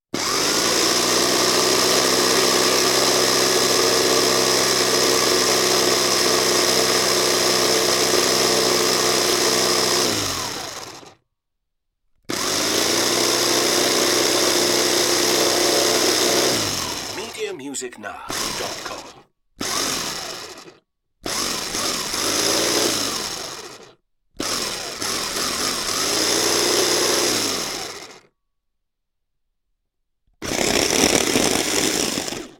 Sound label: chaff-cutter